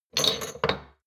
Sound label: shot glasses clatter soft, gastronomie; berufe; soundpool